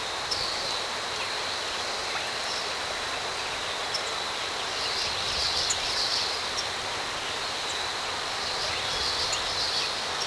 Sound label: Swamp